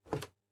Door Close Room Unload

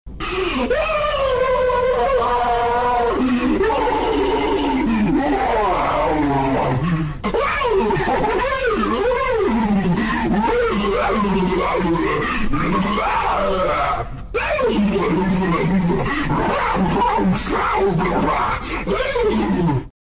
Goofy Scream; Ambush's

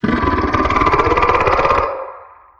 Figure Scream

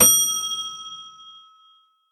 Elevator Bell elevator

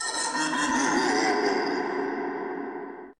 laugh; Grumble; trailer; isolated

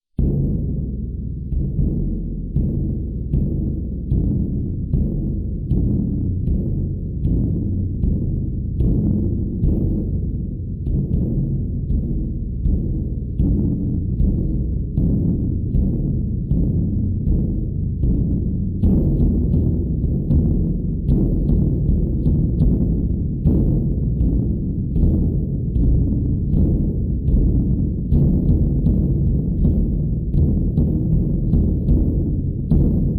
ambience

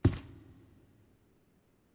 Footstep Fabric; LavaMaker458

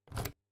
Door Key Unlock

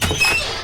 Courtyard Door

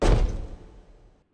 Footstep Diamond Plate; LavaMaker458

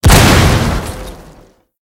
Firedamp Explosion

